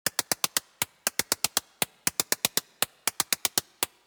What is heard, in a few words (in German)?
Klingelton, modular synthesizer; Superbooth22